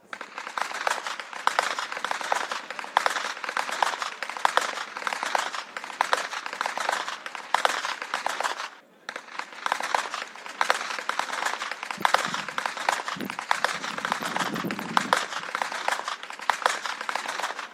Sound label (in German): schnalzen, jugend; schoenram; waging